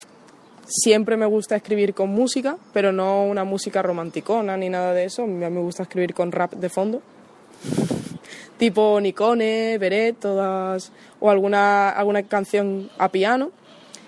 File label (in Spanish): musica